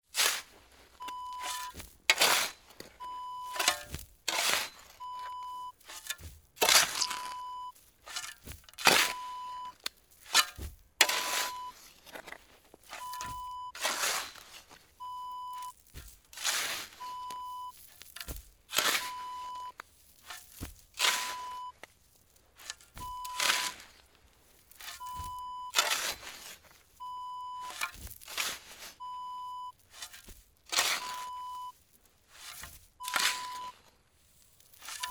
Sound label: shovel digging